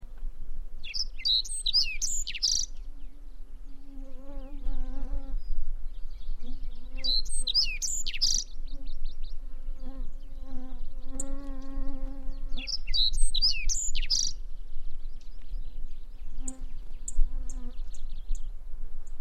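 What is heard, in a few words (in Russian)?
sedogolovaya ovsyanka voice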